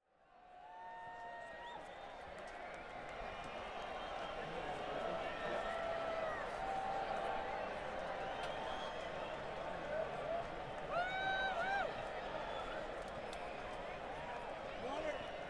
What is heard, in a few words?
Crowd; Boulder, CO - Folsom Field